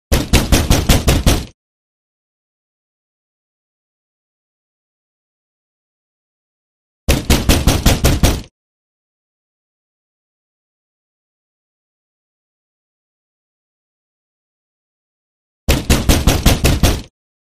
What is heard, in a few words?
door knock